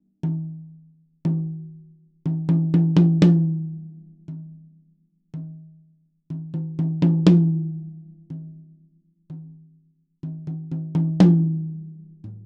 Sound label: Presonus DM-7 Tom Toms